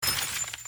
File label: glass breaking theater